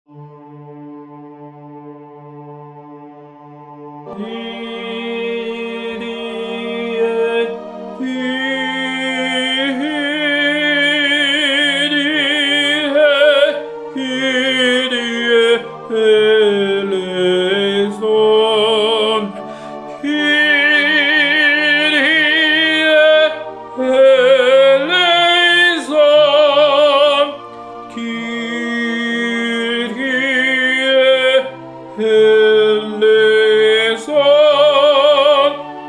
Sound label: tenor; kyrie